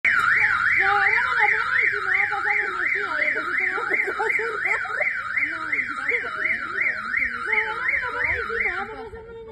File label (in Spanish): Alarma